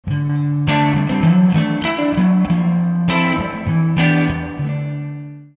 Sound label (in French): reverb; Voici un exemple quelque peu exagéré